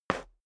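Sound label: step dress boots concrete slide short, footsteps; schritte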